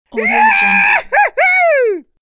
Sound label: male creaky joy scream